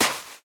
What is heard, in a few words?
sand walk, step; blocks; Minecraft; Remastered